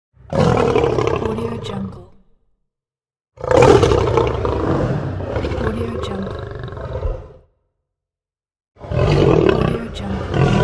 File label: lions; pure-noise